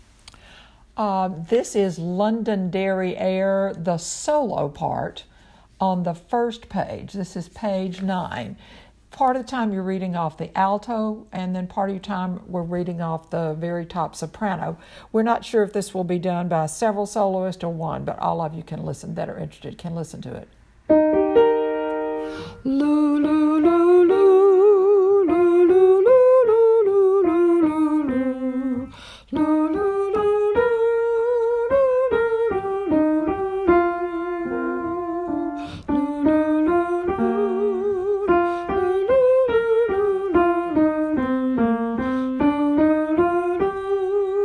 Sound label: Londonderry Air Solos